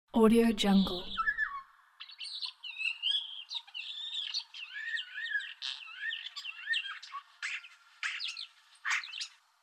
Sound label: starling singing; آواز سار; nature sounds